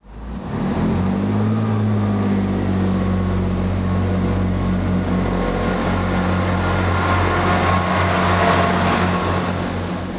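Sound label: class 165, train; konakaboom; simutrans; Pak128.Britain-Ex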